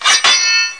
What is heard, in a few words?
SW SWP; thief